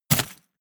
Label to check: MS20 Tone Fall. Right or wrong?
Wrong